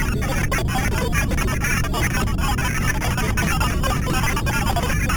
lo rez loop

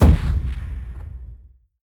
explode ship

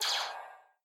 laser shot

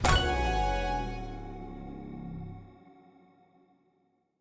silver crate open

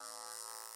zap active loop

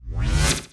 teleport appear

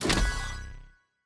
level upgrade

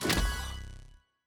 module new rare

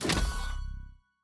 module new common

